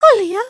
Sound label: curtsey, emotes